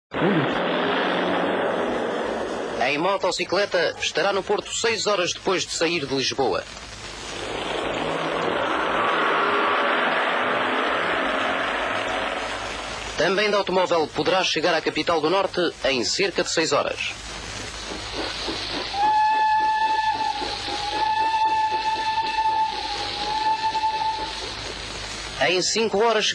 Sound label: tap